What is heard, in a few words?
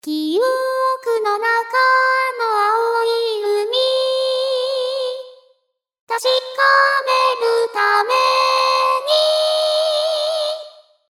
growl